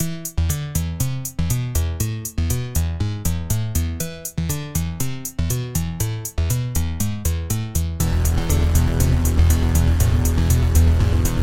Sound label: Hard Bass